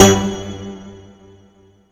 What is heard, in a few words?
PPG TECHNO, PPG WAVE; Rubber Chicken Software Sample Bank